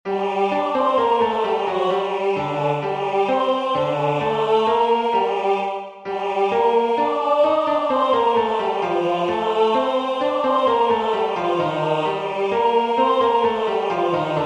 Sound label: Deposuit potentes TUTTI; Vivaldi; Magnificat